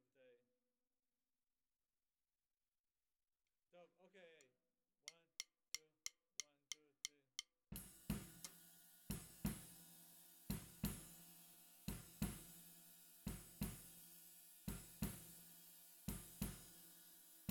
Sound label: SNR